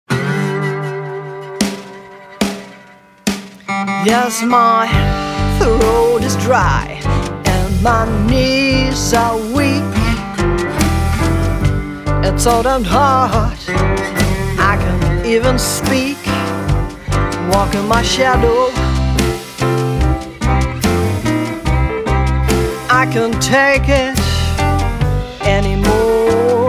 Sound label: acoustic